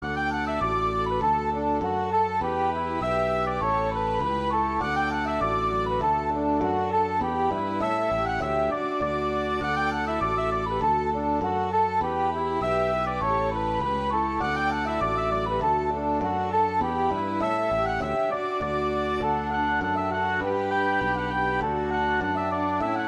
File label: 3 Irish Reels